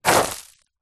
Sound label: foley footstep shoe kick stones ground small; zapsplat